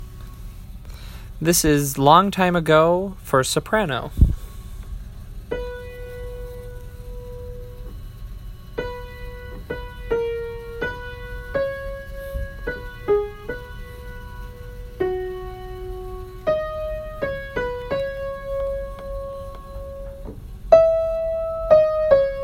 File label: Soprano